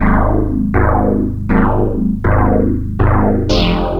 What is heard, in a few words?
Metal Bass; Sonic Foundry (Sony Creative Software); The Electronic Music Manuscript Collection; Bass Foundations